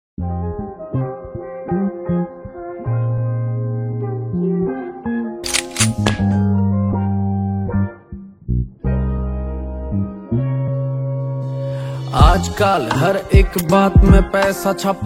New Haryanvi Song

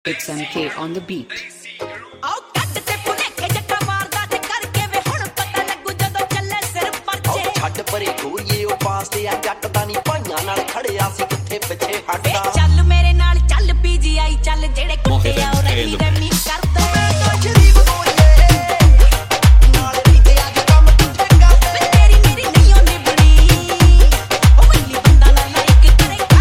Dhol Remix Song

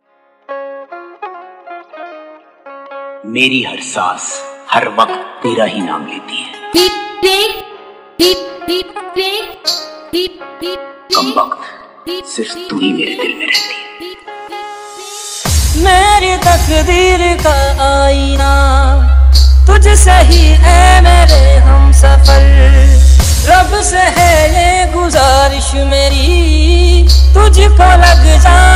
Electro Vibration Remix Song

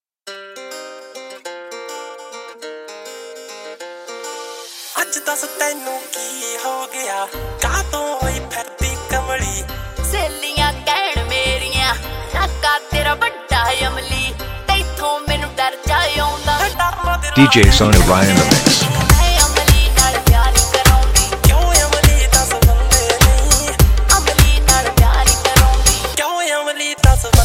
Trance Remix Punjabi Song

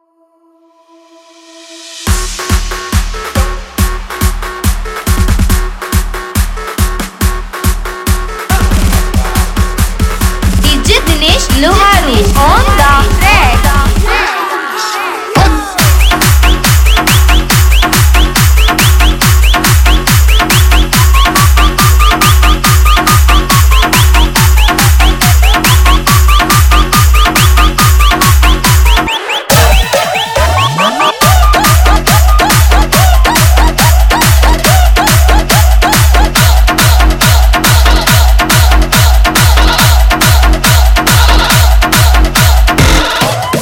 Trance

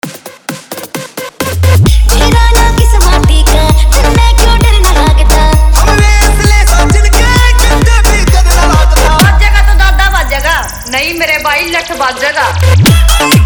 Hard Punch Remix

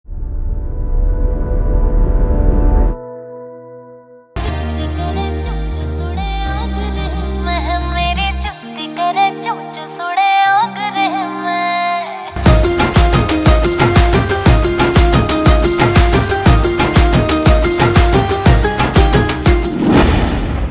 New Haryanvi Songs

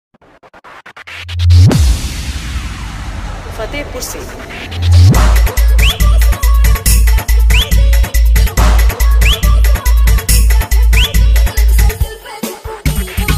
Hard Bass Mix

